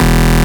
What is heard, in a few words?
Bass Shot E